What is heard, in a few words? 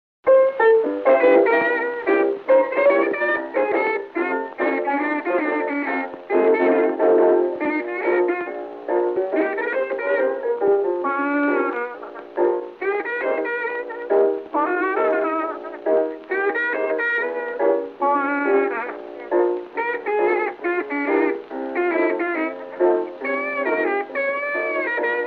Blues